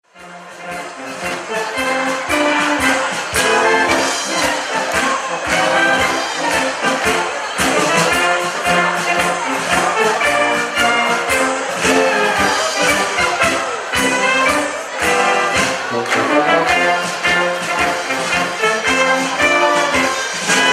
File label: bergsteigermarsch ringtone